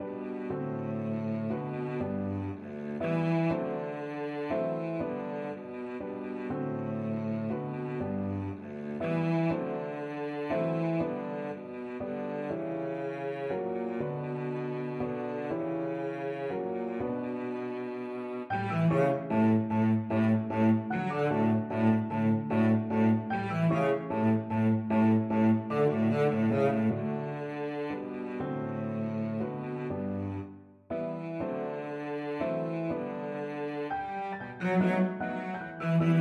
saper vorreste, cello; verdi

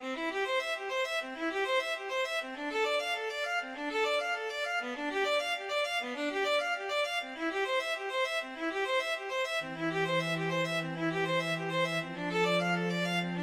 violin cello duet

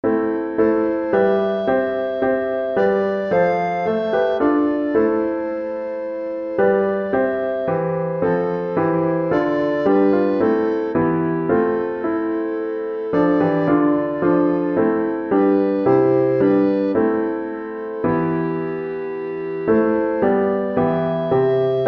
emmanuel, alto recorder

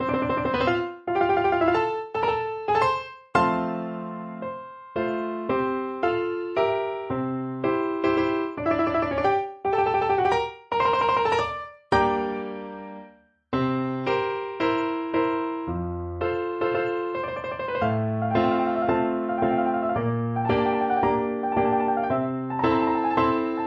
Brazil Nat Anthem, Flute

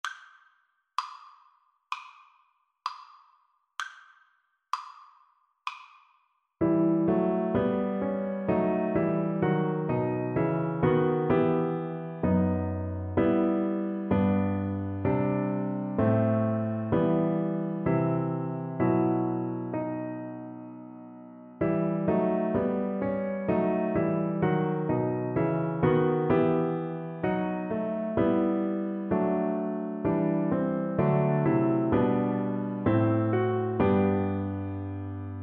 god rest, XYL; Percussion

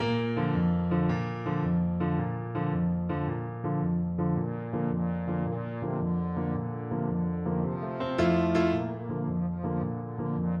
trombone quartet

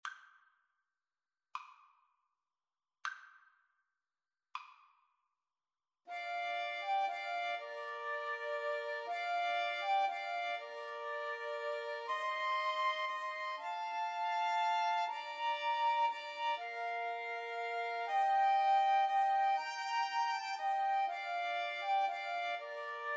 flute violin cello

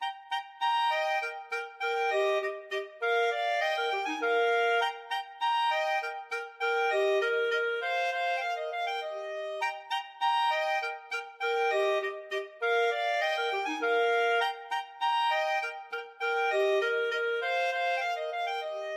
duos allegro clarinet duet

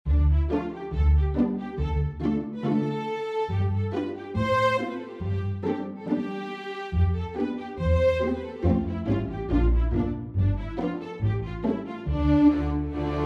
romanian folkdance, string ensemble; bartok

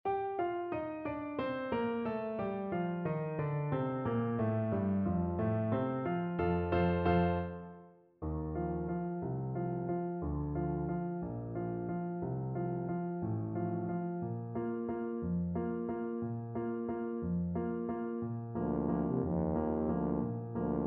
lake, tuba

